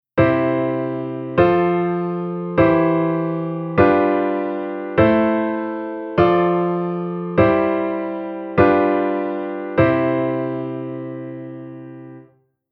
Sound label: Chord Harmony Rhythm